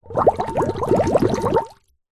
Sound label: water in metal container bubbles blow through plastic flexi tube short; foley